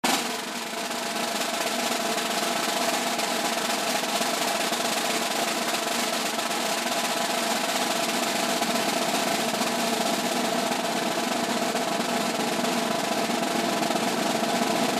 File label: drumroll; civilwar; governorsisland